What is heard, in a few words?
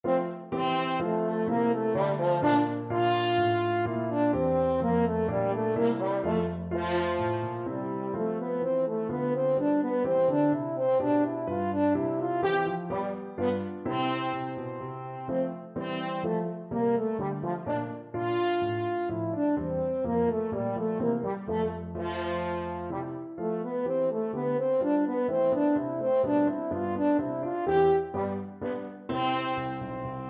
bourree, french horn; handel